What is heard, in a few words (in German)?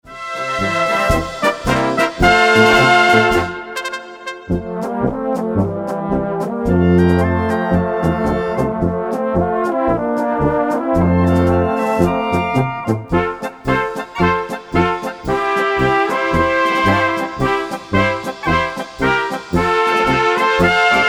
Südböhmische Polka